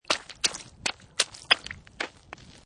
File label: Snow And Ice Stab Ice Several Times With Ice Pick